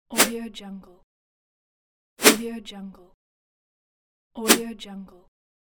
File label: sword swipe; applehillstudios